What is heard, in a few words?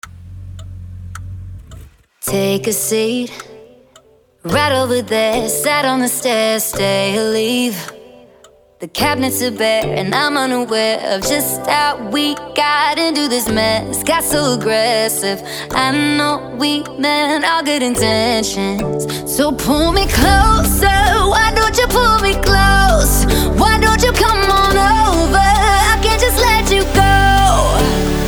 Cheer Tryout Music; Jones MS